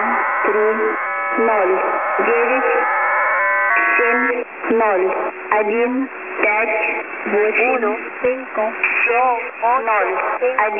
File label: 11635khz; HM01; S06s